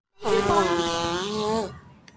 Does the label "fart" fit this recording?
Yes